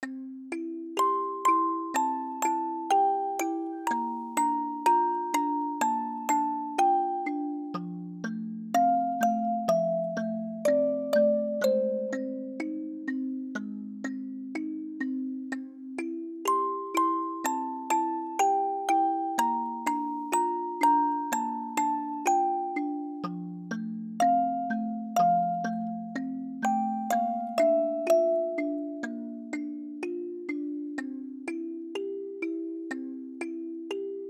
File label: Music Box